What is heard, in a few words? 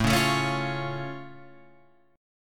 A Augmented A down Guitar Open F; strummed; JGuitar